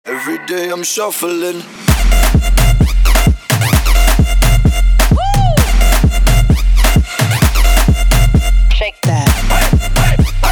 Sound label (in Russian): Отбивка